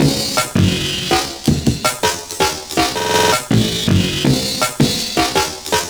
Sound Control Break; Jungle; Breaks; Blu Mar Ten - Jungle Jungle - 1989 to 1999 Samplepack

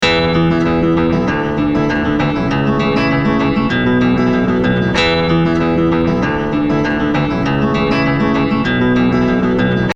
Warped (97 E); CRATES & BREAKS VOL.1 - BEEJ

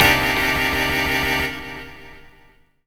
Hit; Blu Mar Ten - Jungle Jungle - 1989 to 1999 Samplepack

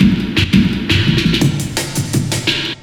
Break; Blu Mar Ten; Jungle Jungle - 1989 to 1999 Samplepack

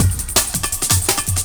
Cumulus Break, 4A, Jungle Breaks; Blu Mar Ten - Jungle Jungle - 1989 to 1999 Samplepack

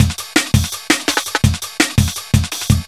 Back Break, 7B, Jungle Breaks; Blu Mar Ten - Jungle Jungle - 1989 to 1999 Samplepack

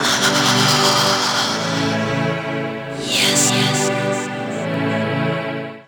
Vox Synths; Jungle; Riffs, Arps & Hits; Blu Mar Ten; Jungle - 1989 to 1999

